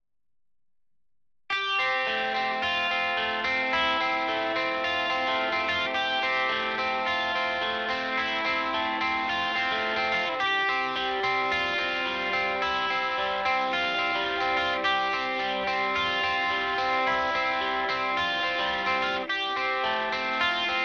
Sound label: guitar